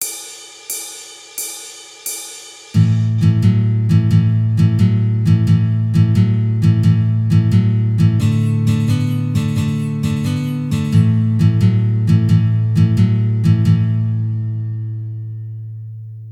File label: Blues Shuffle